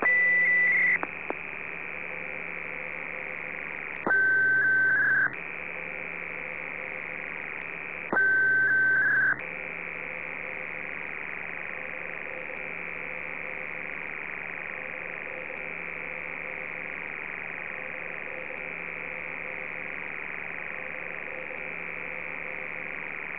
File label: station; de desert